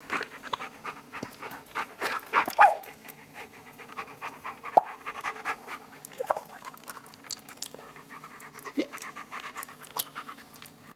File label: heavy dog panting and wet mouth smacks; animals